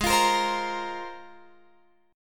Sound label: G sharp 6th Add 9th, Guitar, Open F; strummed; x,15,17,17,15,17; G#6add9